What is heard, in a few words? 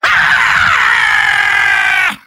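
soldier pain critical death; vo; tf2; mvm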